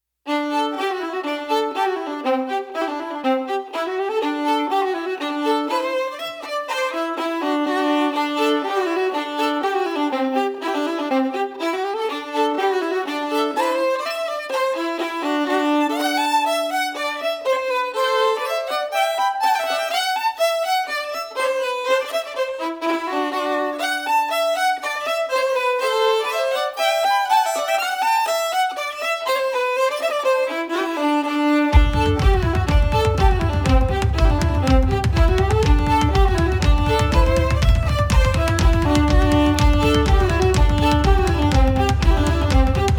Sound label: Jig